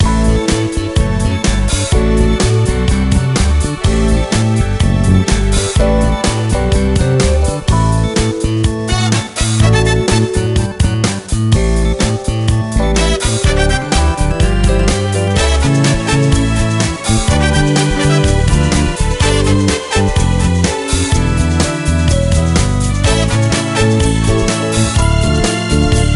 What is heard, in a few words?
Dance Music